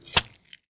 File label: bloody knife stab